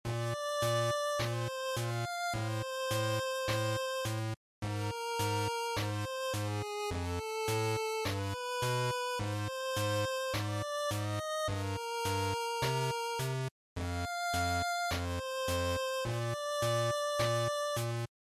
GB Fighting Loop145

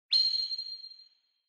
Onoma Flash High Short

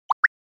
Anime Motion, Mid

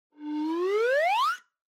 Slide Whistle Long Up

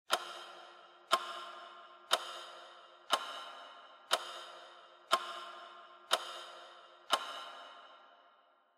Clock Second Hand Reverb

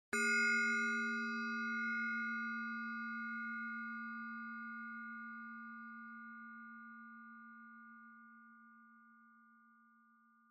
Triangle Single

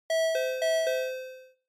Quiz Ding Dong Slow; クイズ ピンポン; 遅

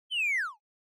Motion Falling Whistle Short

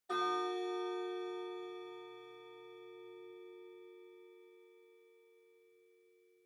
Pendulum Clock Chime Far High

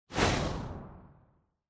Camera Motion Short; カメラモーション10-3(短); シーン・カメラモーション

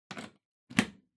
Power Strip Adaptor Floor Gentle Plug In

